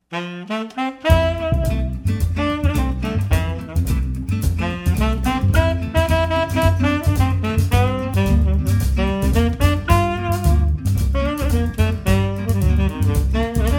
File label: samba